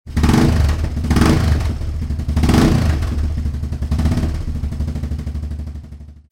Succession d'acceleration moto ss rouler

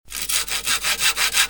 Scie; bruitage; Outillages

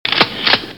Rechargement, Armes; bruitage

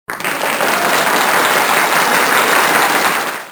Applaudissement; bruitage

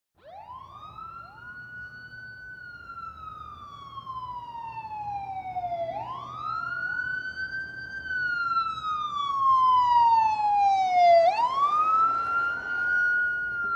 Sirène de police us

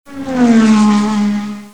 Voiture à gde vitesse

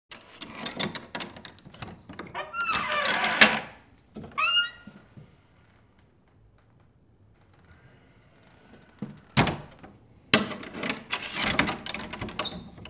Ouvrir et fermer une porte

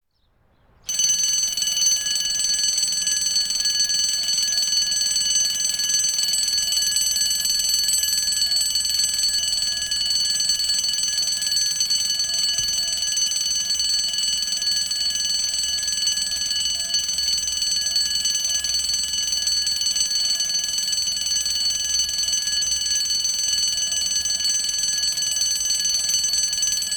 Signal passage à niveau

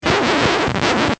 Vynil rayage; bruitage; Electro-menager

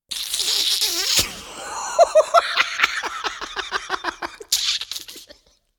Fou rires

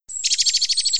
Moineau; Ombres du passé

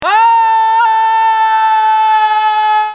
Ahhhhhhhhhh, Cris et douleurs; bruitage

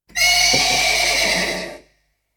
Cheval rigole, Animaux; bruitage

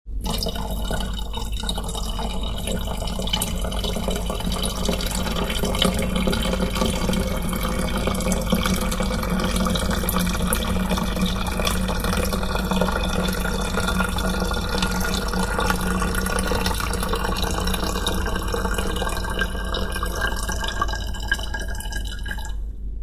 Pipi ds le bocal

